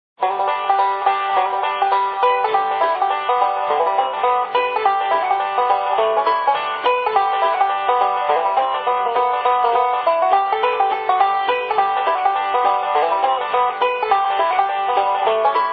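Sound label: Music Ambient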